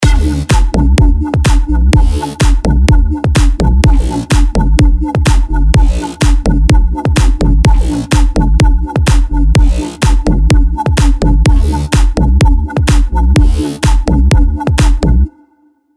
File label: EDM; Nexus 3; Deeper